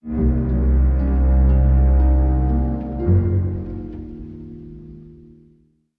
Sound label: strings, sad